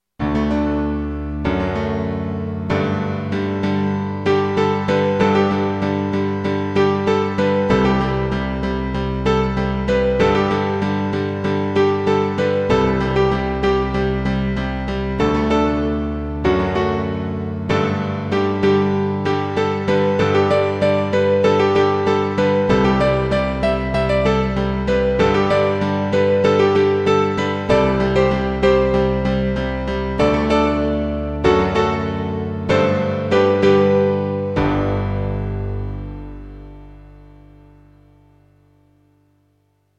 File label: Instrumental theater; Manfred Upnmoor; Frauen-Maenner-Abgruende